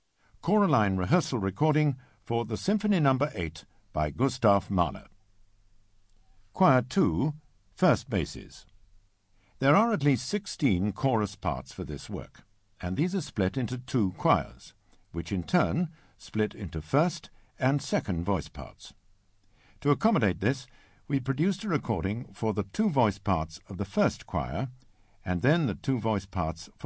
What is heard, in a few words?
Bass; Mahler No8